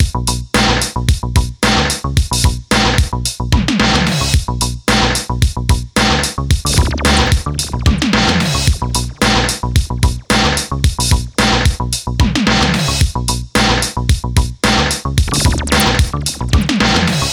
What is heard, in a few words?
Retro Action Groove